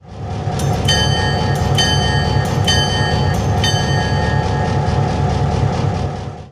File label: CN Bronze Bell; RailPro